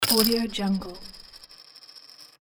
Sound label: confidently dropping casino chips on casino table